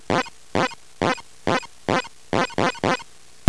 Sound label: fart